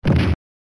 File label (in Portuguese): soco; Ben 10 - Alien Rivals; ThrillGames